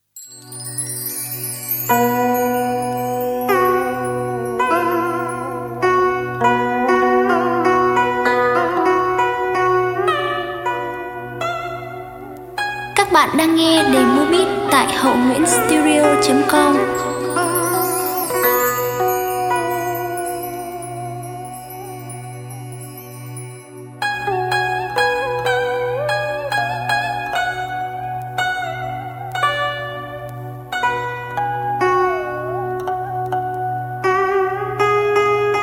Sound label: beat, playback